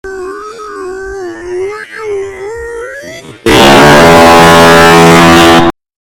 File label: brain fart slowed but its more violent reverb